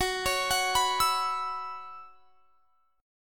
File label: Gb 7th Suspended 4th Gb down, chord; Gb7sus4 arpeggiated